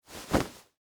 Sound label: Suit Coat Adjust, Foley, Clothes, Suit, Coat, Adjust, Movement, Straighten, Arm, Single; Dressing; BlastWave